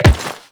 Step Metal, Footsteps; Characters; Warframe; The New War; Kahl

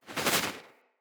Duck Tail Shake; Foley

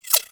Sentient Symbilyst Projectile By; Warframe; Weapons

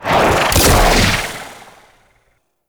Ranger Cast B; Warframe

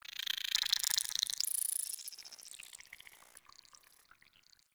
tunnel critter; Warframe; Tau

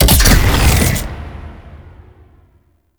Layer Alt Shot, Shotgun; Warframe; Weapons; Tenno; Revenant; Phantasma Prime

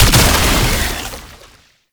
Weakpoint Destroyed; Enemies; Gameplay; Lasrian Tank Infested; Warframe

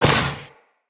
Step Default, Footsteps; Moa; Moa Combined

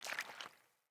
Grunt Boot Walk Water, Footsteps, Boots; Enemy; Sinecure; Melee Grunt

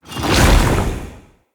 Staff Caster Cast Short; Weapons; Staves; Dendrit Staff Caster

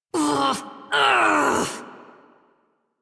Exertion Adult Operator; Warframe

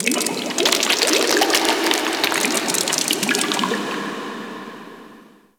Ocean Cave Crack Small, Ambience; Warframe; Grineer Ocean

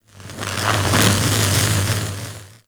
Magnetized Random; Warframe

